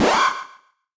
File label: Retro Alarmed; Alarms Blip Beeps; GameSFX